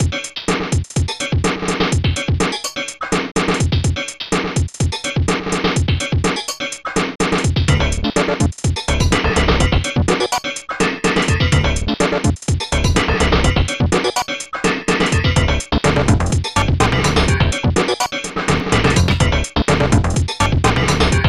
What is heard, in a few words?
chipfunk; Sounds Terrific CD; Weird Science; Amiga; Protracker Module